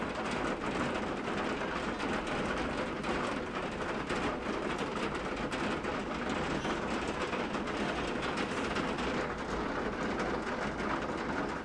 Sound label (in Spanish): asi suena la maravillosa lluvia sevillana